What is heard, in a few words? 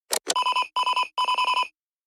Jingle Alert; Christmas 2024 - We Three Spies